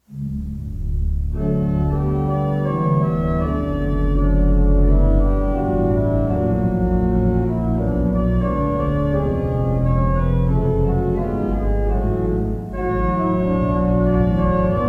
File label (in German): Orgelstück